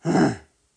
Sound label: Grunt